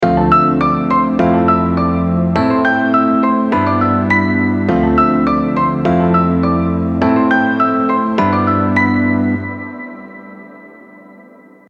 Hip-Hop Trap Bounce, piano

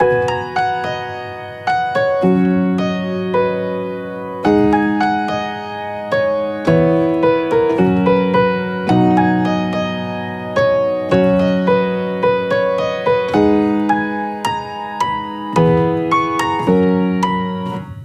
Emotional Melody Peaceful 108 BPM

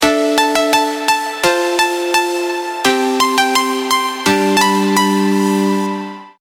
Melody Plucks

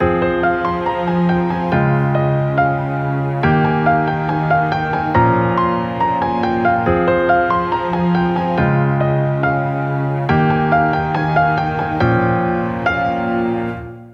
Emotional Melody Heavy Feeling 70BPM, piano

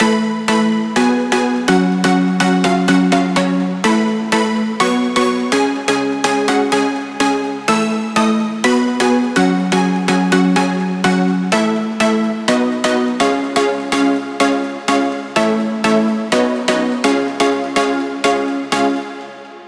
EDM Progression 122BPM

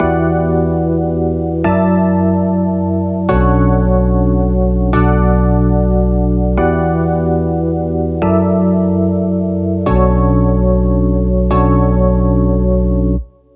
RB Hip-Hop Progression Soulful 73BPM

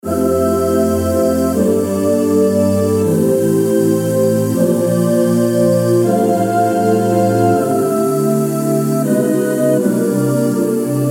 Emotional Beautiful Bounce, piano

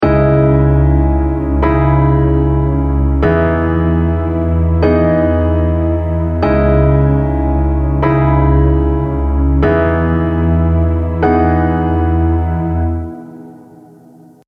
Emotional Beautiful Bounce, piano

